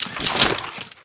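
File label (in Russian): падение оружия из рюкзака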